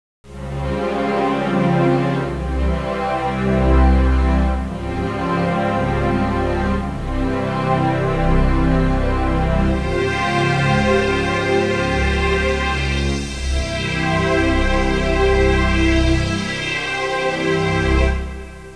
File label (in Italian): audio suonerie musica mistica